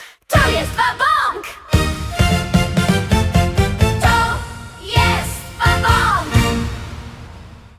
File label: jingle